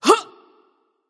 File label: grunt hit; smite; izanami